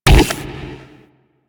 Mine Impact

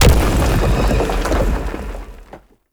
Octopede Unearth Proj By Explosion; Warframe; ManInTheWall

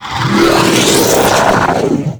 Capital Ship Missile Whizzby; Warframe; Corpus

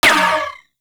Uzi Layer; Warframe; Tenno; Prime Tenno Uzi

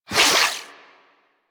Beam Retract; Glaxion Beam Moa

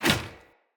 Hunter Bow Melee Whoosh; Weapons; Player; Bows; EnvoyHunterBowRangedMelee

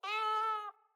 Kitten Meow Agitated; Enemies; Catbrow

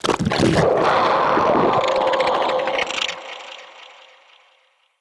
Vocal Angry, Creatures; Shrimp; Vocals; Baliq Shrimp Glade

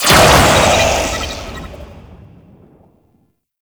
Trap Spawn In, Weapons; Warframe; Cephalon; Librarian

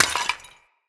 Vase Clay Tiny; Vases

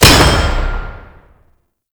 Royal Guard Jumping Smash Hit; Warframe; Grineer; Abilities

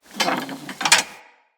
Smithy Metal Misc; Ambience; Soul Plane

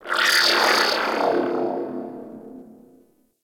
Corpus Ship Data Chatter, Ambience; Warframe; Corpus Ship Remaster

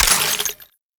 Ammo Pickup Radiation; Ammo Ability; Warframe; Warframes; Frumentarius

